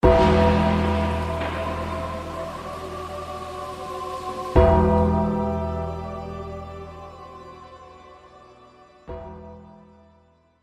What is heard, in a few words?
bell; Undertaker